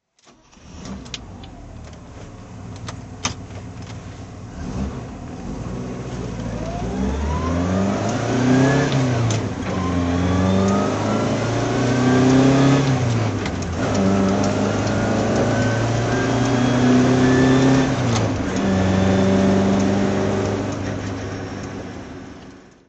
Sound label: saab 96 v4 drive